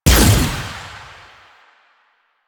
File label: Fire Shot